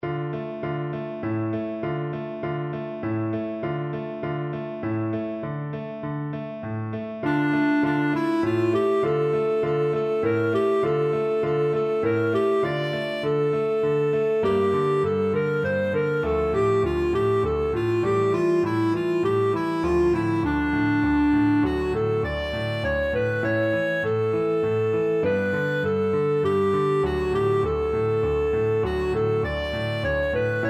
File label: a la nanita nana, clarinet